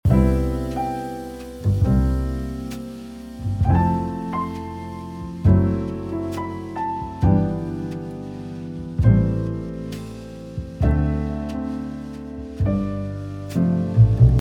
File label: Living Room - Reading scene, Jazz; IKEA Smart Home Planner